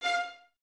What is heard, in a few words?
Violins F 5, String Ensembles; Miroslav Vitous; LINKS ORCH